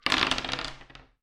Dice Throw Five Dice On Wood Table

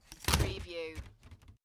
Clatter; beanstalk audio

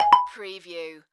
Multimedia Alert

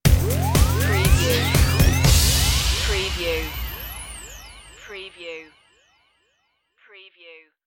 welcome stinger

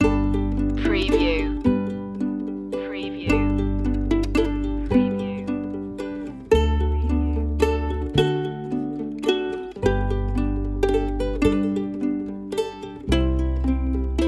silent night ukulele

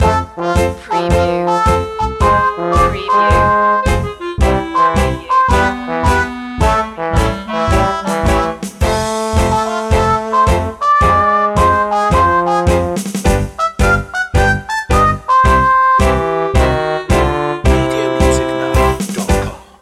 straw hat loop full

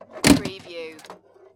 Pinball sound, ka-chunk with following movements

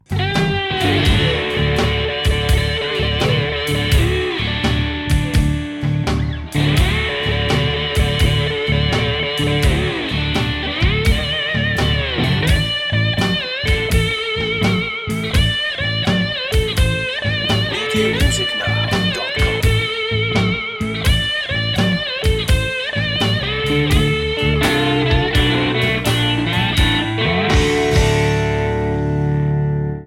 bottleneck blues

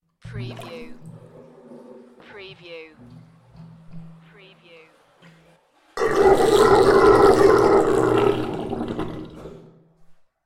Plug pull with gurgle; beanstalk audio

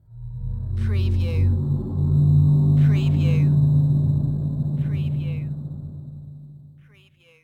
HORROR GHOST GROWL

